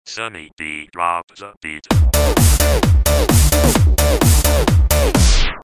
Drop The Beat

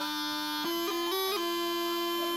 bagpipe harmony

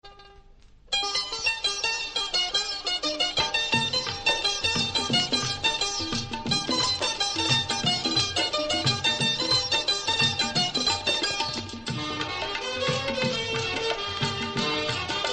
Sweet Music

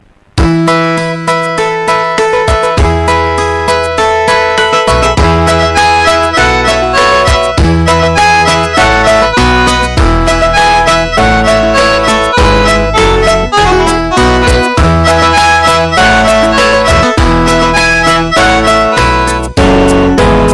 Slow Piano

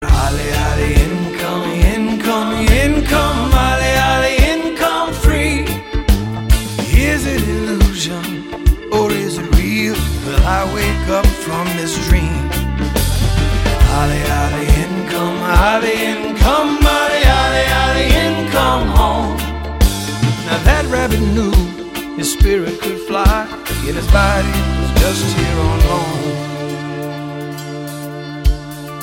Nice song

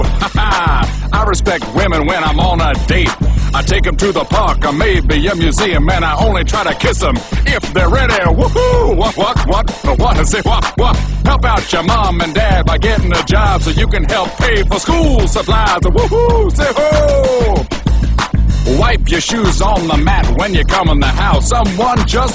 Clean Singing

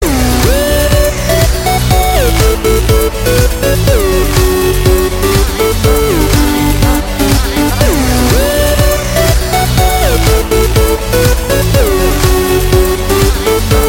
Party Tone

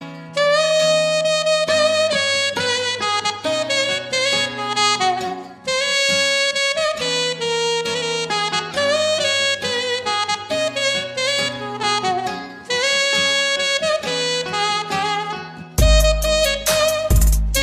Sax

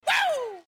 Woo

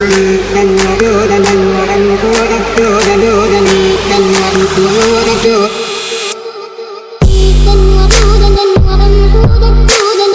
Arabic Remix

